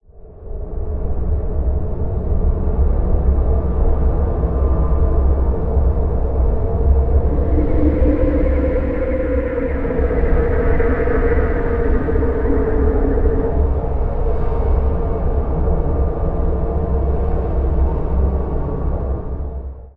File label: Middle Monster Robe Wave